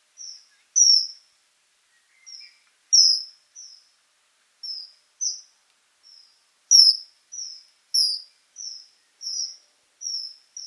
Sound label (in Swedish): Kungsfiskare